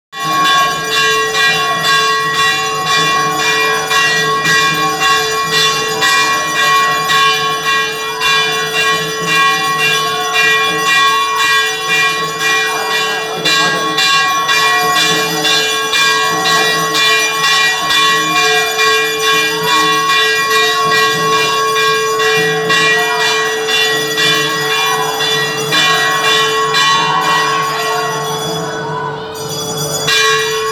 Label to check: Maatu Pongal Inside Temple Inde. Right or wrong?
Right